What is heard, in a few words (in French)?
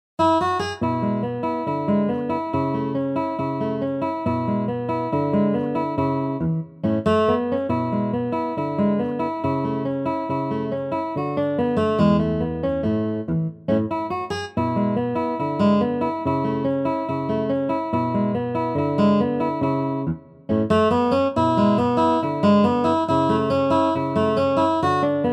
MINI ARPEGE